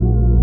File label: voice bass hit; Weird and Interesting Hits; single hits